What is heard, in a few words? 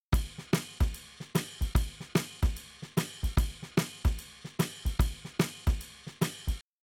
Drums, shuffle drums; WDR2